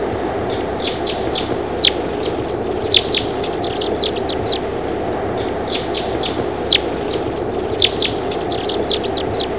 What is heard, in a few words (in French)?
bec croise des sapins, chant